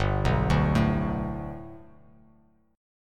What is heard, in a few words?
G Major Add 9th, Bass Standard; arpeggiated